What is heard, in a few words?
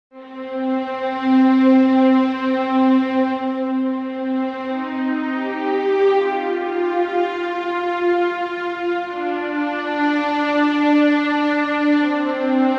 Orchestral Version; UMD Preview; Ace Combat X Skies of Deception; PSP